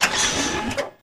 Mask Compartment Door, sliding door

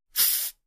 hydraulic hiss

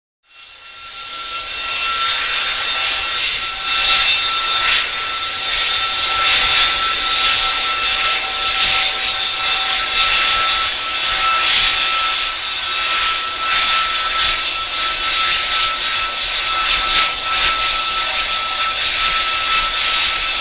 Jet Engine Idle Harri, av-8b harrier

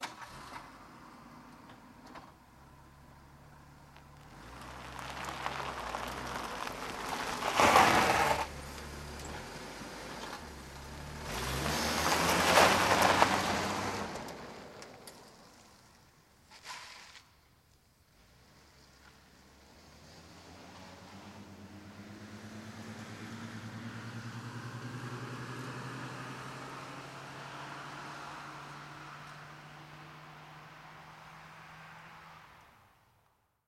Car Start Medium

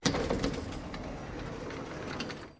Elevator Gates Doors

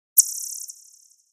Elect Chirp Reverb, multimedia element

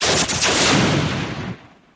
Hand Phaser Sngl Sho

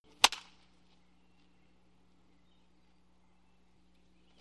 Baseball Bat Wood Hi; baseball fx & games

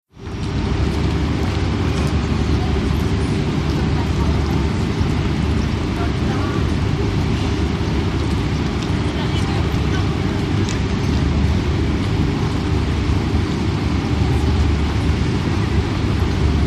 Train Station Gen Atm

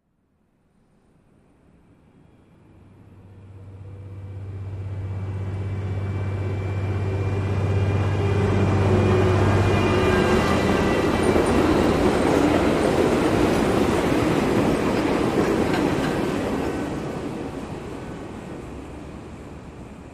Train By Smooth Elect, monorail

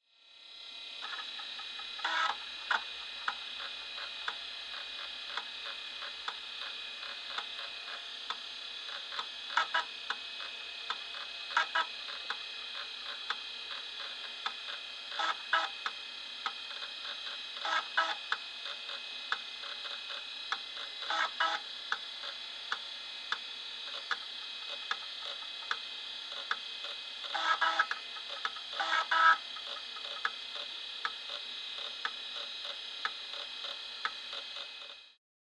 Floppy Drive Accessing

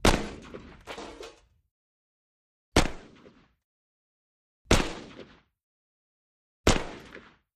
Russian SKS Single, sks 7x6

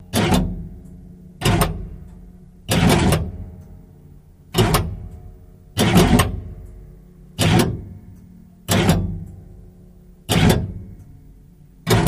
Metal Clunks Latch, gears

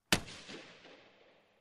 Hockey Puck Hits

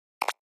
Button Thick Elect Sh

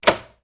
Switches, Industrial

